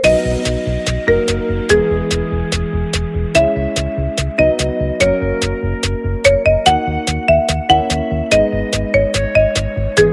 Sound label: Apple iPhone X marimba ringtone